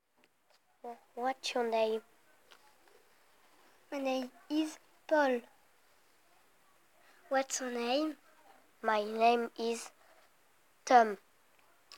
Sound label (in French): vocal